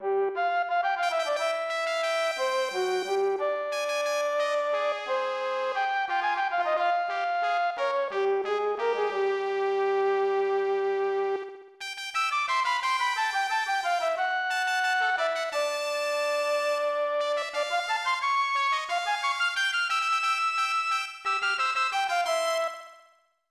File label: Orchestra Concert; lennus 2; snes